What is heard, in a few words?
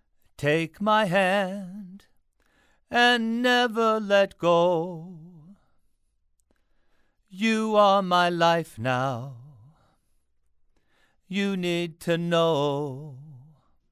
Male Vocals